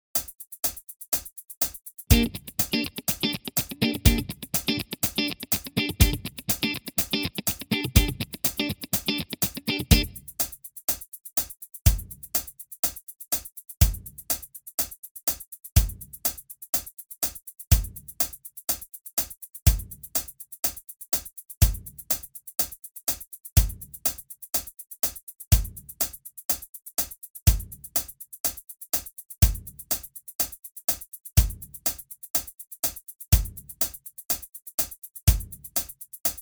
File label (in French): cocottes combo